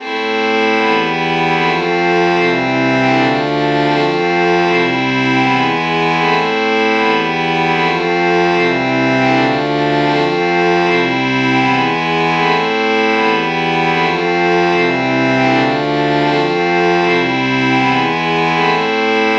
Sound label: violin violin lpc true chord morph